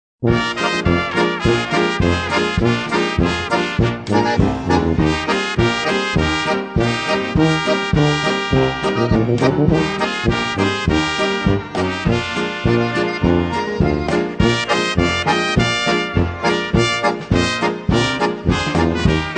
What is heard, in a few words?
STRINGBEAN POLKA